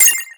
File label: key; ball rolling game